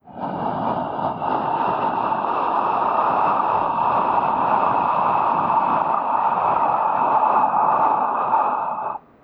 Voz inarmonico exhalado p

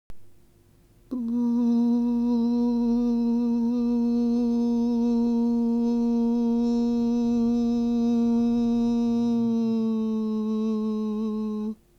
Sonido claro

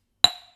Golpe audio

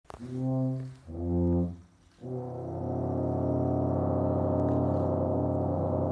Multifonico de tuba

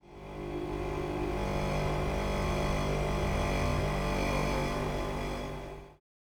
Mesa de Madera pp; Mesafrot

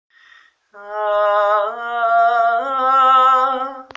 Sonido agradable

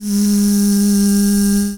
Voz tenor ord mf

